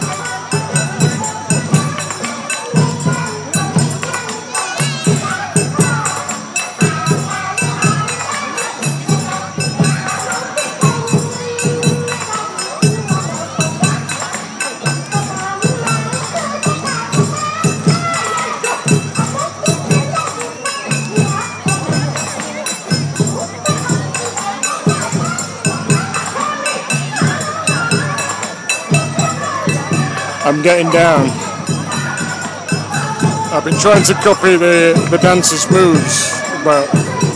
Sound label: kameido bon odori